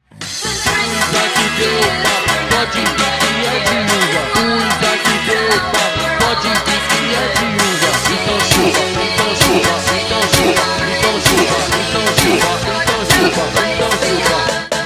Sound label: funk, phonk